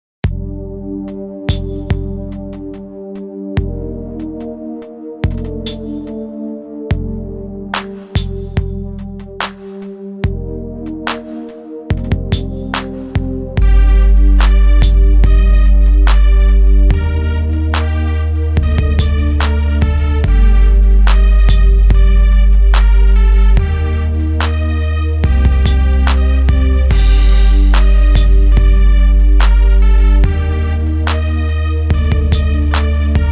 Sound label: Trap